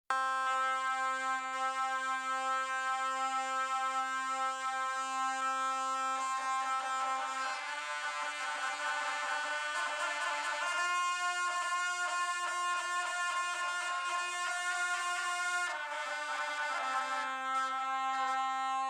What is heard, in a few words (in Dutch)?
Tibetaanse Hornblazer; Sogyal Rinpoche; boeddhisme